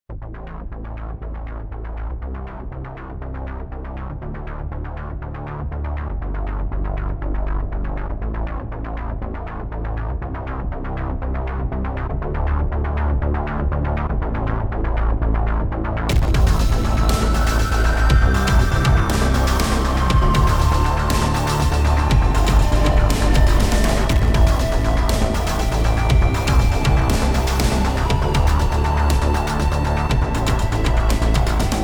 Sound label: Epic Funk